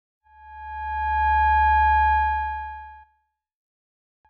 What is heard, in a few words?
Sci-Fi Electronic Swell; Science Fiction